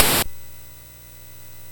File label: Ducks Ahoy, MOS6581R4